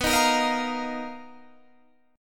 B Major 7th Flat 5th B, Guitar, Half Step Down

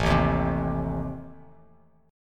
A Diminished A down Bass Standard, chord; Adim strummed; JGuitar

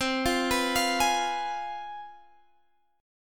C Major 7th Suspended 4th Sharp 5th

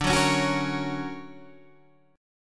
D Augmented Major 7th down Guitar Standard; D+M7 strummed; JGuitar

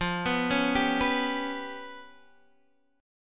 G 7th Flat 5th

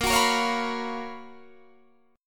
Gb Major 11th

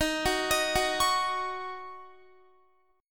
D sharp Minor 7th, Guitar; arpeggiated

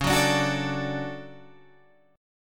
D Major C sharp, guitar

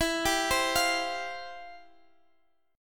C Suspended 4th E; Csus4/E

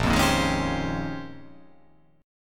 Bb Minor 13th, Bbm13 chord; strummed; JGuitar

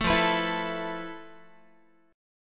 F Major Sharp 11th, Guitar; FM#11; 12,13,14,14,13,x; 4A,4E,5A,5D,5G#,6C#; strummed

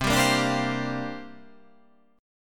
C 9th Suspended 4th C down

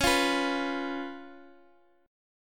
C sharp 7th, C sharp, down, Guitar, Open D

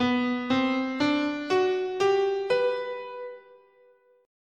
B Balinese Pelog, scale

